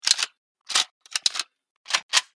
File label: aug reload; weapons